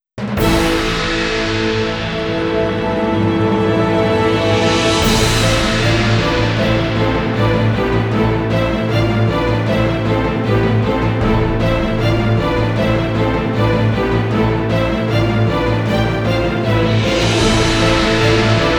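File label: background music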